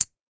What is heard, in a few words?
chip selector, roulette